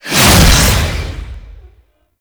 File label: Infested Melee Swing; Oni